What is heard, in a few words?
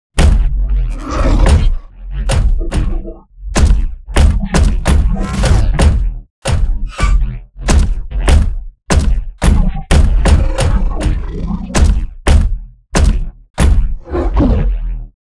sphere land; bt; Titanfall 2